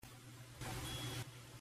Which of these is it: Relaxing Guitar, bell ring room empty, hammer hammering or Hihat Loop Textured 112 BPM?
bell ring room empty